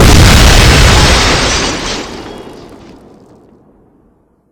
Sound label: ORB EXPLODE